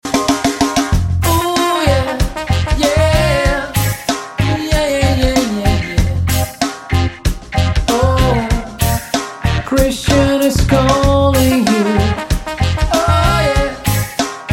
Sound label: ska ringtone